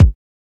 subby kick drum single hit e key, Royality free steel kick drum sample tuned to t; kick drum samples